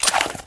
pistol draw; weapons

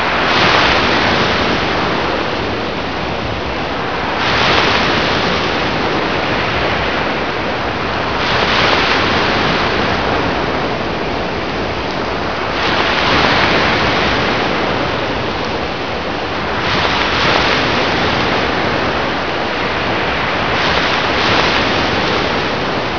ocean

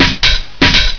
mat beat; svencoop

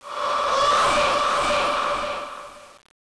ghoul idle; cstrike

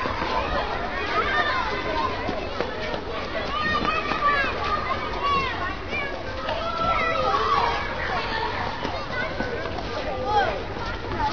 moon, ambience

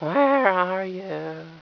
hc idle, headcrab; svencoop

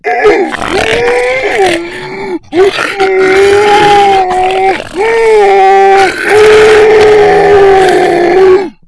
moan loop

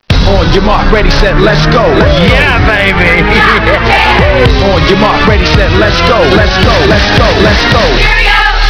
cheer mix

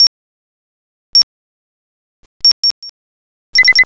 timer; tfc; timerbag

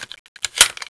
clip in; svencoop

